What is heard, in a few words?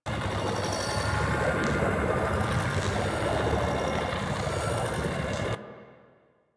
Zap Projectile Loop; Warframe; Trapper